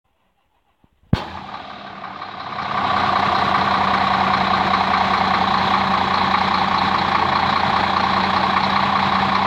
Scania M320 440HP

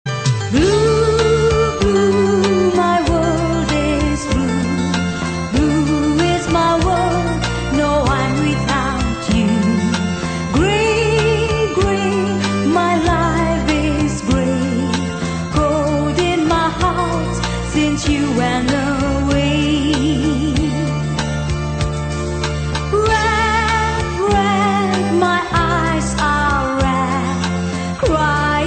Female

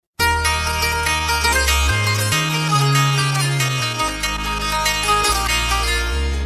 Balochi Sms

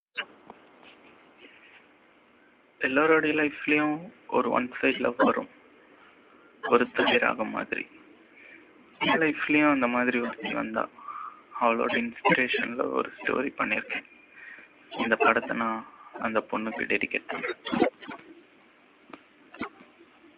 Tamil Love Feel

